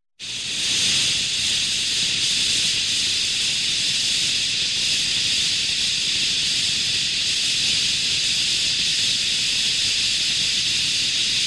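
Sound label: sounds of aerosol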